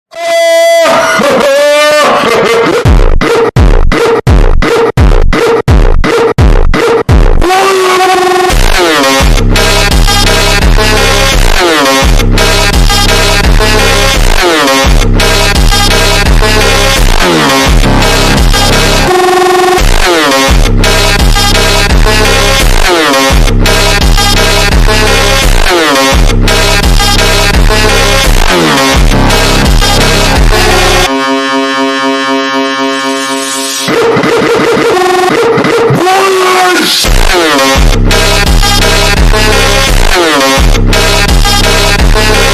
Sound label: korean funk slowed reverb